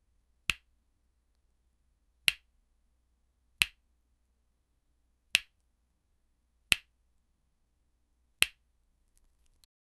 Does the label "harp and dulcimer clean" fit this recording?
No